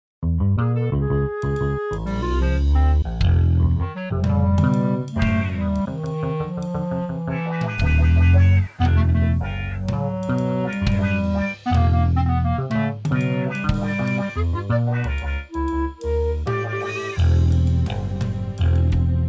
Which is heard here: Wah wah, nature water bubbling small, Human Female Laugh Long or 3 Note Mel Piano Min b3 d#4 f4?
Wah wah